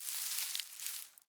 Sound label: Forest Open Glade Grass Move Close, Day